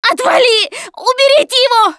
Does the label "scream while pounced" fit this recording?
Yes